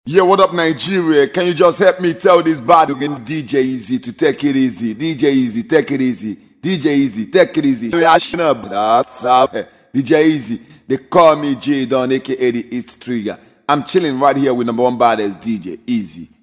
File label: XMAS GOSPEL MIX